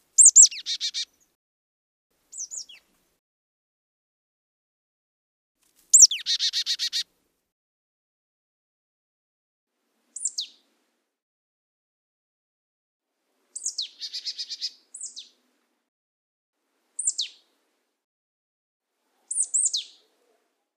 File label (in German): Ruf, Alpenmeisen